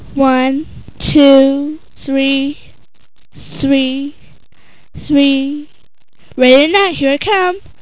KIDS; KRONDOR

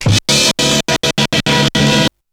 TREM SHT; BEAT2000

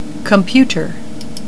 COMPUTER

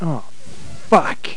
gameover; Bounce Ball

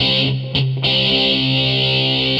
GUITAR, ROCK POP; MAGIX

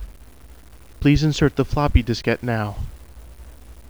INS FLOP

